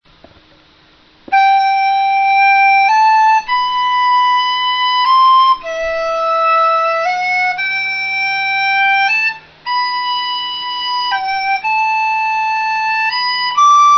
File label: dizi